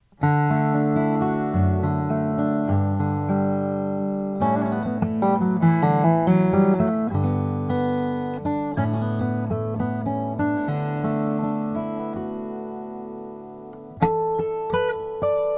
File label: rainsong graphite black